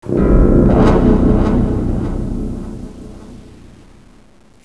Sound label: scary